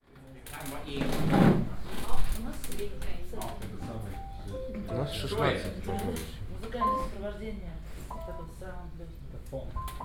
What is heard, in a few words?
piano; sverigesradio; norrkoping